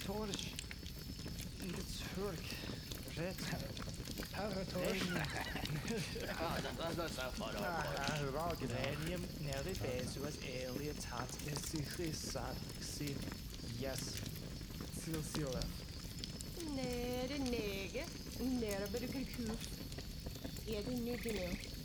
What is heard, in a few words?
soldiers camp, ambience; pf2e; hellbreakers